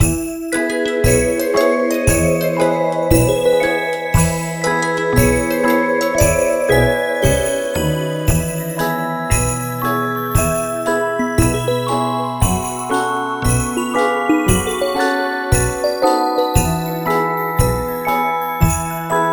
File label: Base Idle Plant Snow; Rush2; Plants vs. Zombies Garden Warfare 2; PS4 Windows Xbox One